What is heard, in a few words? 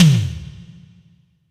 Kawai K5000W Syn Drum